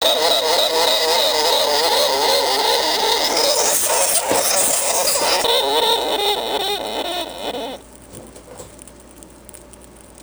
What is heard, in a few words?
glider crabbing; Here is a glider crabbing while fussing with another in the cage; sugar glider